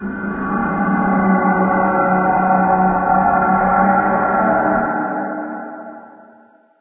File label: gunship distant; Garry's Mod; hl2; streetwar